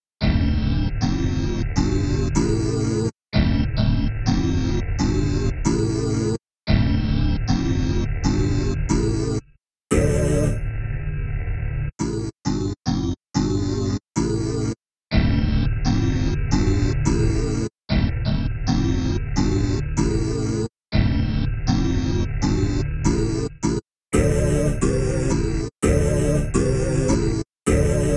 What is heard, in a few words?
synth riff